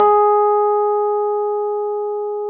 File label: RHODES G#3; Sonic Reality - Vintage Timetraveler